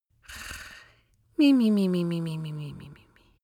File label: sweet snarling; vocals; comedy-cartoon; soundpool